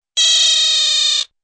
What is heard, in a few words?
high razz